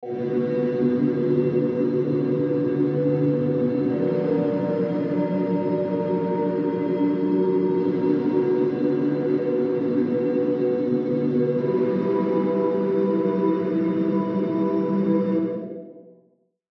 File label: battle sci-fi